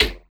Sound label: Percussive; Mutekki Media; Vengeance Electroshock Vol.2; VES2 Percussion